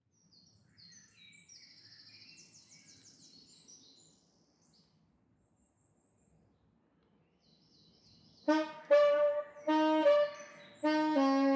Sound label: forest dawn